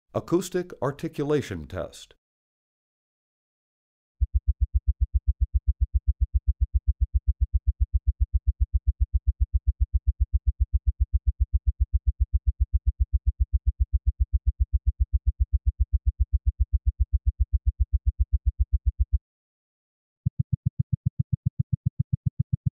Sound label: Articulation test 63 and 125 Hz